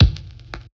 drumbreak kick; Hiphop

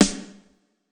japanese pop snare

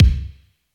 drumbreak kick dry; Hiphop

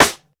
Classic hiphop snare

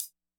disco hihat

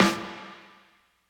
80s reverb snare

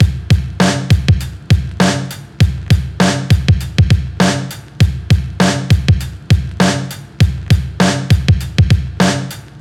drumbreak loop 100; Hiphop

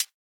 Classic hiphop hihat

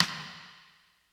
80s reverb snare

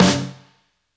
drumbreak snare dry; Hiphop

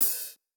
open hihat, japanese pop

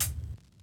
drumbreak hihat; Hiphop

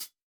Hihat; urban grooves; Rock

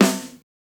snare samples, Rock

